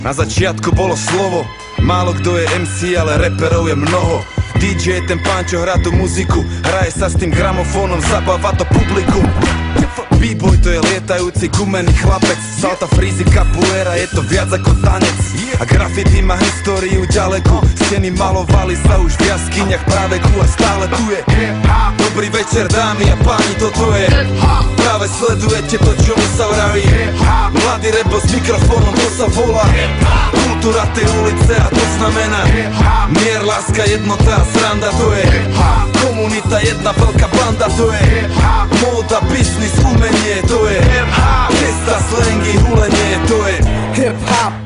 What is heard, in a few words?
Hip Hop